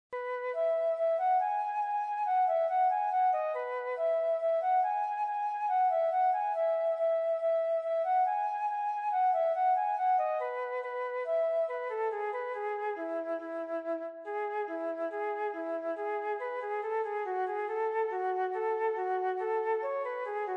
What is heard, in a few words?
Flute and Orchestra